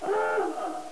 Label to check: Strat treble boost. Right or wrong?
Wrong